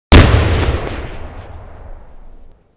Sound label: little bird fire